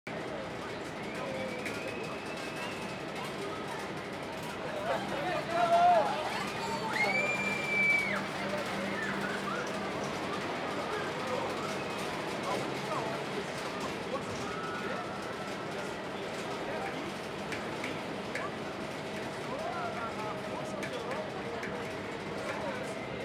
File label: Roller Coaster Idle - Crowd; Amusement Park Ambience; Wakerone